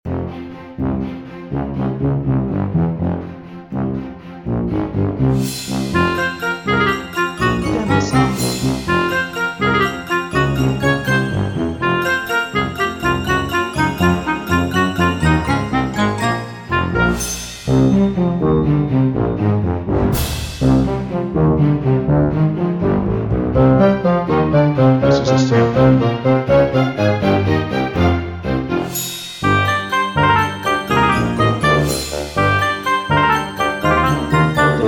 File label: Funny Clarinet Madness, Loop